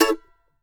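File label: mandolin A major, Country; Sampleheads; Whole Lotta Country 1 & 2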